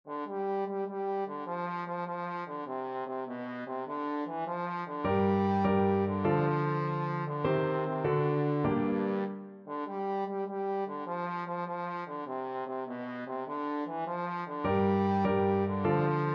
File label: trombone